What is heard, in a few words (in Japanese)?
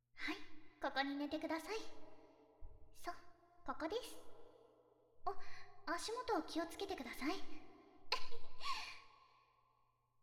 耳マッサージ, ASMR